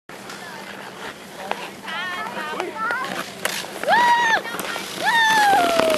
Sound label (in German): Eislaufen